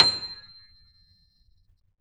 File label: B 6, Keyboards Acoustic, GRANDP ST; USB Soundscan vol.17